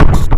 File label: ministrider footstep; npc